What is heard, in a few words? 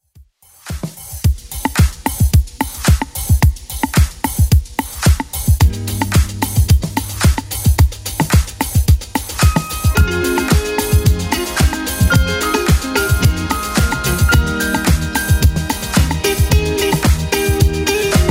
80s Club Edit 110bpm